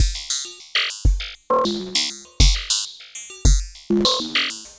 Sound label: Ratchet Beat; Sonic Foundry (Sony Creative Software); Ma Ja Le Ethereal Textures; Beats